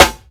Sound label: snappy snare drum C sharp key